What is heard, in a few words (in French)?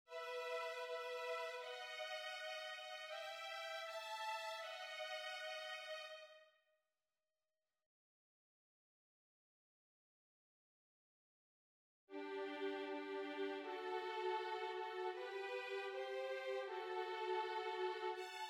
VIOLONS